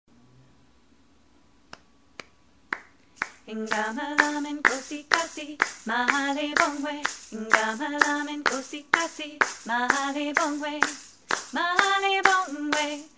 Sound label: Mali Bongwe, South African